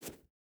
footstep barefoot sand jump start; Rust

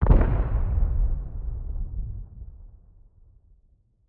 satchel charge explosion distant; Rust

